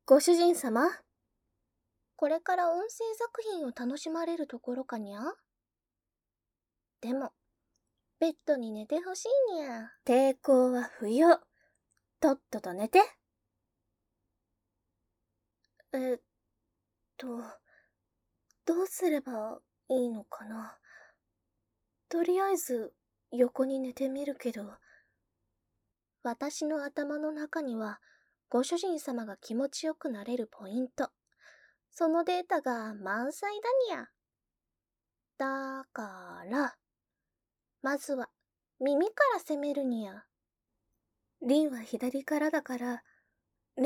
耳舐め+フェラ(効果音なし) ステレオ; ペロペロご奉仕勝負!

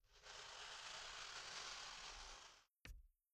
ふすま閉め

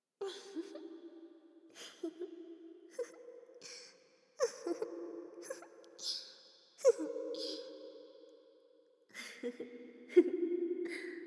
女の子たちの嘲笑; リディクル・エクスタシー催眠～女の子たちに嘲笑される快感～

